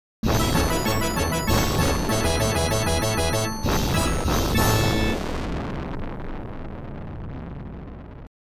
Big Points Collected

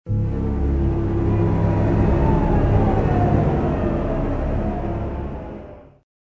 Caves Transition

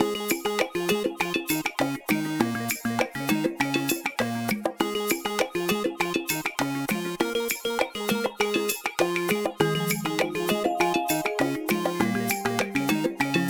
gimmick holoboard music interact; Spacebase Startopia

